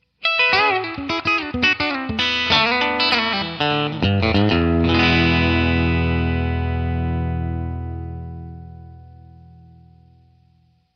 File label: country, guitars